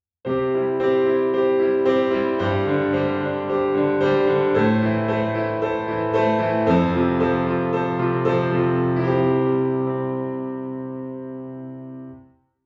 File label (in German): Sus Akkorde durchgehend G sus4